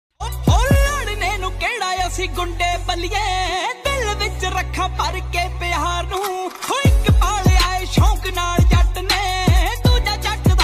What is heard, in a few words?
Popular Punjabi Ringtone